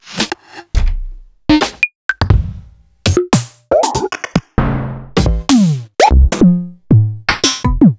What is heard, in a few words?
Percussions; Sliced